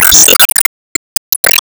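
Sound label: Enemy Hourglass Minion Active Hit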